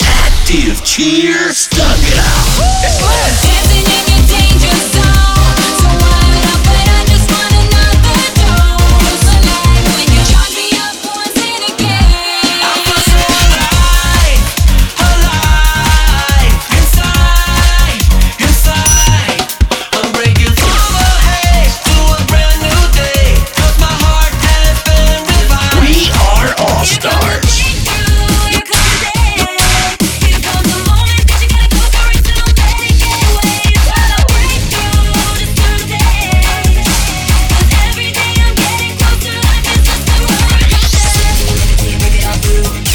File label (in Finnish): musiikki; cheerleading